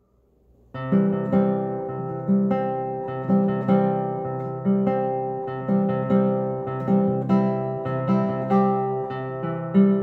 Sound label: Wedding March